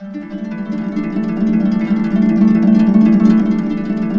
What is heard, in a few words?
E, Harps; Complete Harps; USB Soundscan vol.62; AKAI